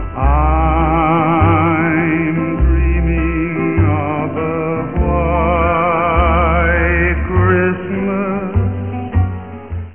MALE SINGING

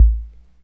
KICK, Solid Analog Kit; Hip Hop; STYLE KITS (Hip Hop, Etc)

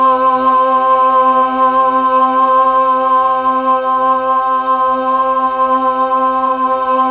Mellotron Female Choir C2

